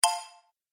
Musical Game Elements, Video Game

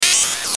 Glitch Digital Rip; Production Element Imaging Element Accent Transition

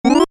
Computer Beep, Short; Multimedia; Stuart Duffield

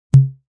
Pong; Pings; multimedia

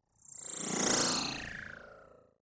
Whoosh Flutter, Digital; Whoosh Sound Effects; SoundEffects-BlastWave

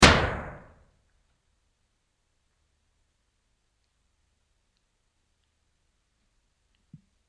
Gunshot; found sounds; Andrew Fleming

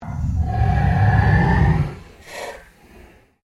Ambient Pulse Ghost Chatter

